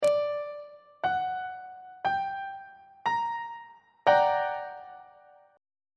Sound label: f double sharp minor major 7th 2nd inversion on treble clef